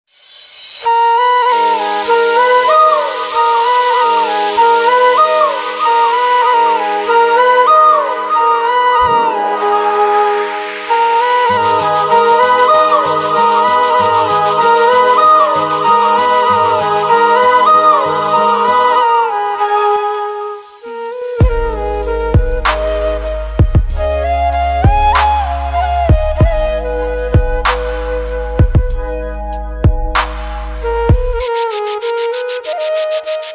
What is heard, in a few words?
sad shayari background music flute ringtone poetry flute bgm